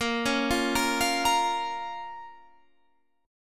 A sharp Minor A sharp down Guitar Standard; arpeggiated; JGuitar

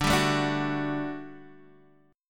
G Major 7th Suspended 2nd D

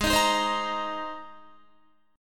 G sharp Major Add 9th G sharp, Guitar; G#add9 Chord

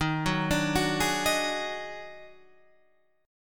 Eb Minor Major 11th, Guitar, Standard; EbmM11; arpeggiated; JGuitar

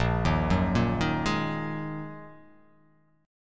Bb 7th Suspended 4th Sharp 5th Bb; Bb7sus4#5; arpeggiated; 6,6,4,3,3,3,x,x; JGuitar

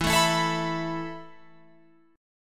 E Suspended 4th E, down, Guitar, Open F; Esus4; strummed

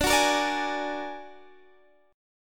A sharp Augmented 7th D, guitar

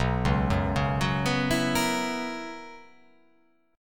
B Major 7th Suspended 2nd Suspended 4th B

